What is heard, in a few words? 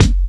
clean bass drum F# key